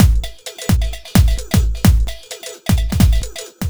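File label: 130 Tinny Break No Snare, Drum Loops; Zero-G; Soundclash Nu Dance Electro; Acidized Wavs; 130bpm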